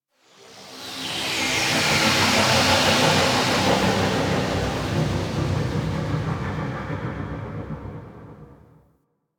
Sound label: Exterior Cloud Probe, Ambience; Warframe; Rail Jack